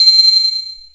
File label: DIGITAL SEQ, AX-60; Rubber Chicken Software Sample Bank